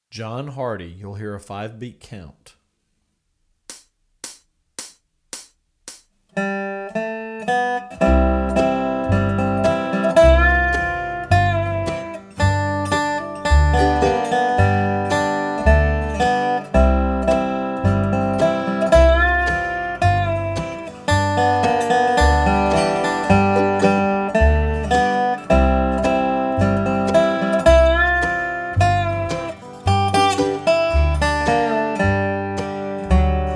John Hardy Fast

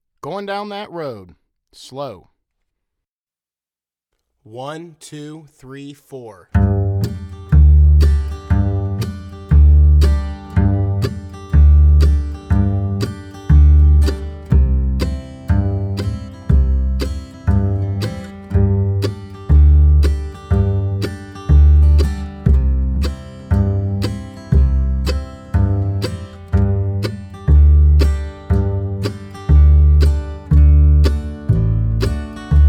Slow; Goin Down That Road

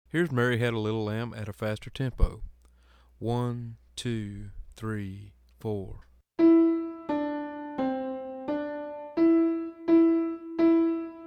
Mary Had A Little Lamb Fast

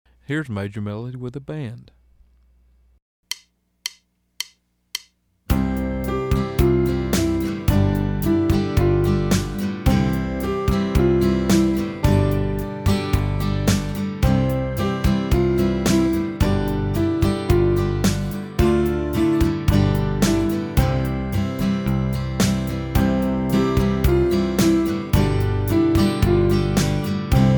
Major Melody Fast

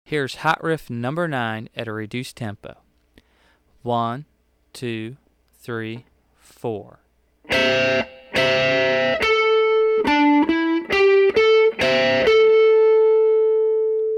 Hot Licks Riff

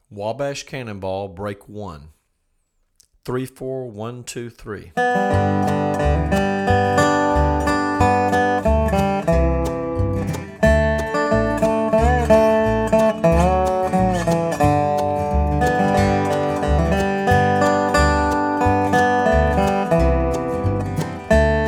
Fast; Wabash Cannonball

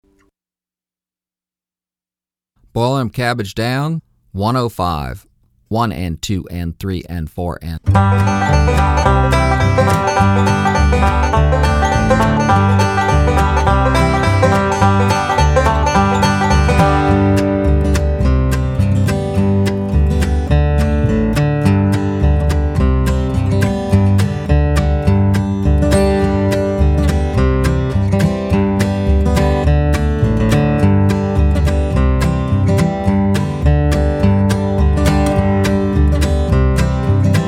Boil Them Cabbage Down, 105bpm

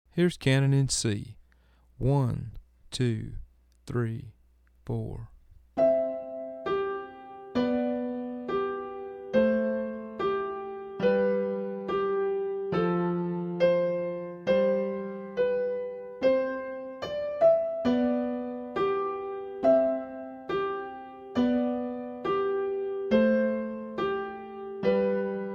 Canon In C Slow

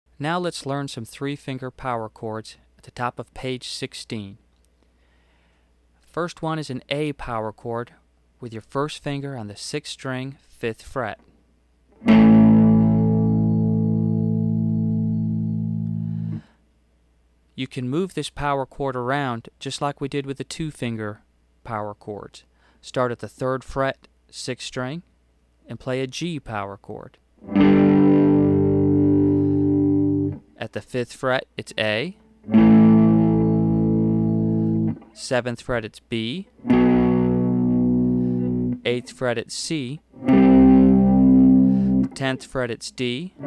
3 Finger Power Chords

